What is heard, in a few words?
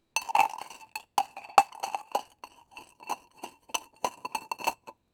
the sound of dunking a, biscuit